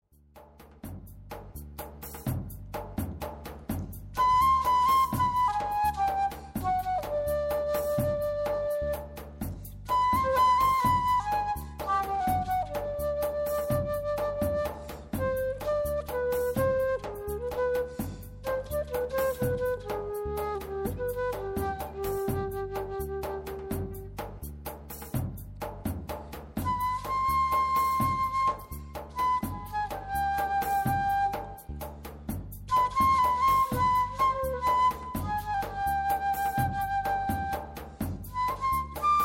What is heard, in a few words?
flute